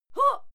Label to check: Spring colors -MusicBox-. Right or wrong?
Wrong